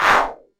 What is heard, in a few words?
slide in; transition; huashu design; sfx